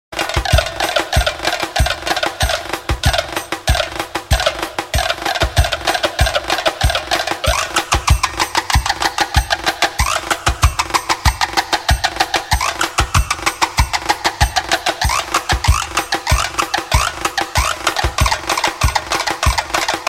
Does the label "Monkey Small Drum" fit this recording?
Yes